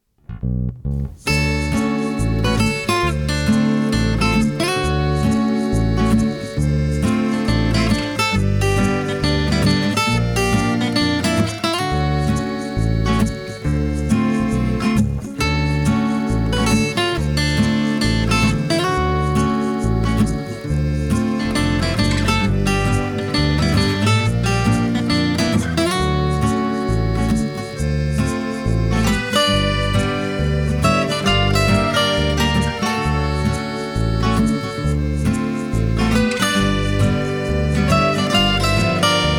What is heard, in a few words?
Odd Chords idea